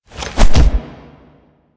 Glyph Game Push Foley; Drifter; Warframe; Duviri; Glyph Puzzle

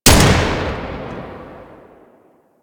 Flak Cannon Fire 3D B; Grineer Death Squad

